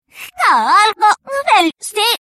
clock, reverse

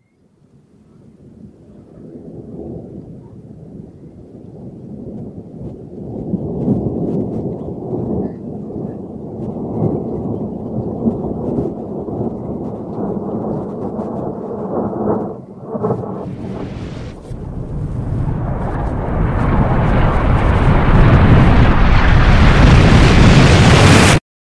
explosion, reverse

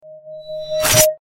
attack ping, reverse

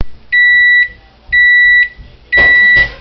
oven timer

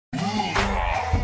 HOO UGH; reversevape

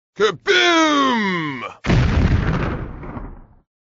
kaboom

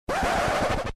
scratch; cave

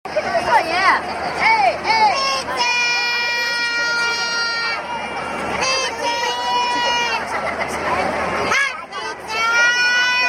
pizz

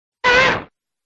the sound lizalfos make; Ocarina of Time

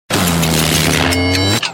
time stop

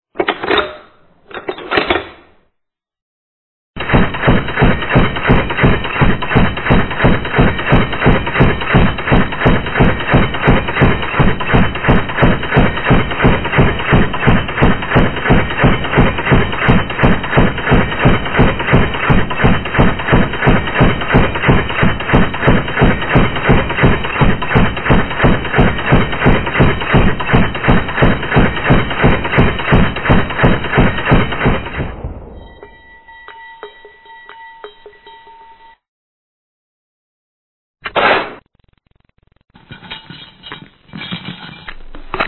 gun; vapesquared

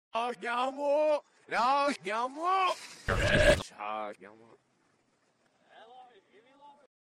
turkey; reverse

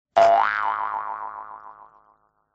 spring

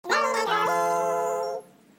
learning

